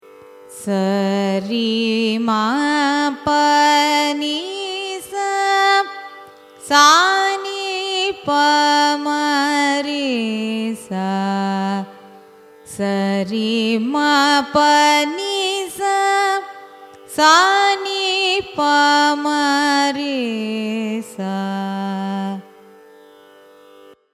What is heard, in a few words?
raga hamsanadam arohanam avarohanam; carnatic music